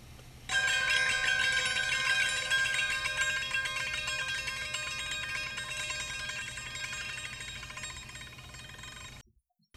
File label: Door Harp